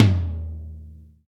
TOM

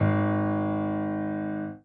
G#2 Ab2, Piano

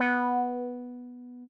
B4 meow

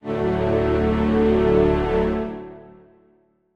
Strings start

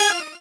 normal hit normal

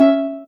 soft hitwhistle; osu

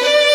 violin; osu

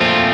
Amaj9

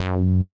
F#3 bass

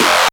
bass c

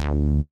D3 bass

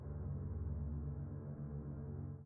chord

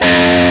Left-hand vibrato; osu

